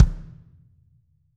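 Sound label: AMB KIK, ambient kick, studio; AKAI S6000 CD-ROM - Volume 3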